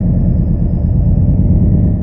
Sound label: machinery loop